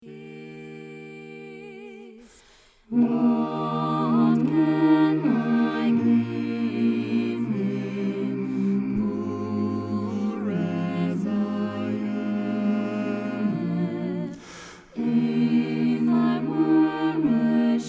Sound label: In the bleak mid winter TENOR